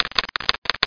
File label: teletype; MPG-Net (USA); empire